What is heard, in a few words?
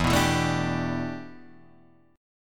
E-Minor 11th, Em11 chord; strummed; JGuitar